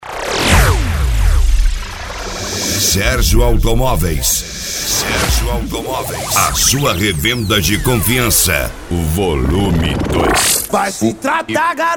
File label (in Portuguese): Funk Eletronica; Sergio Automoveis Vol 02; Parobe RS; Open Format